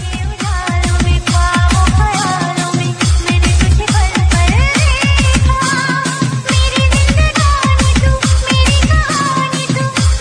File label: love romantic ringtone, bollywood ringtones